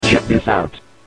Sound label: Bolt sfx; Die of Death; Aeroware